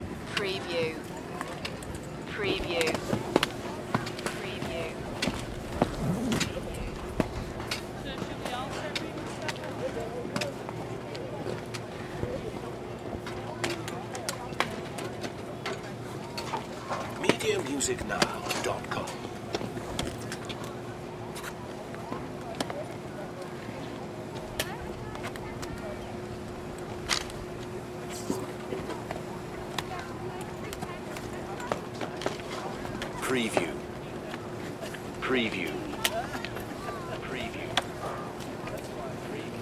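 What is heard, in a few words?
Ski Resort Ambience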